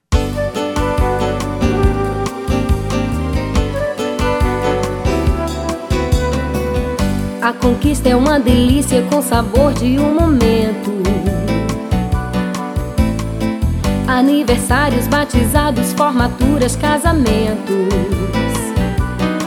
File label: jingle